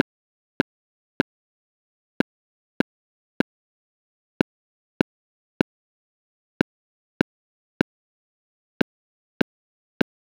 Clarinet vs Violin

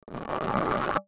wood

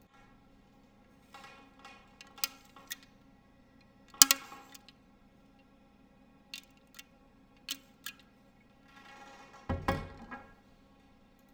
loud sound

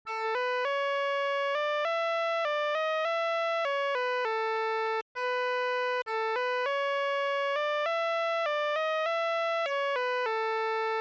bow

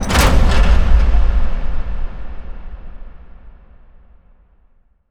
door close

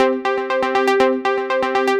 Dance Floor Pattern

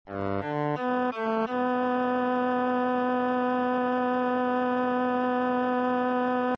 bowed

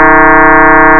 fm not int

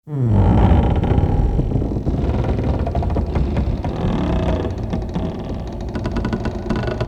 door

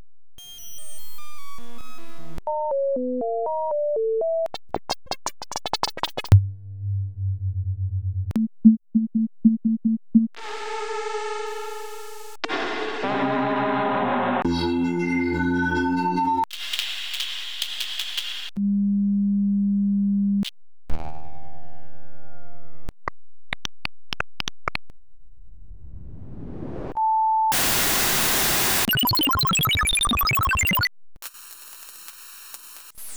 collage

